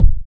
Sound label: kick, Deep House; single hits